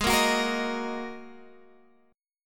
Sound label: G sharp 7th Suspended 2nd, Guitar, Open G; down; chord shape